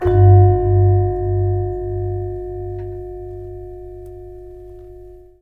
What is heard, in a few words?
GAMELAN HIT; Spectrasonics - Heart of Asia CD2